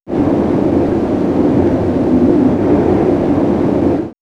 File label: Nature Cold Wind; X-Static Goldmine